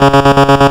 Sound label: Sawtooth Trem C#2; Ableton Foundation FreePacks; Foundation Glitch Toys & LoFi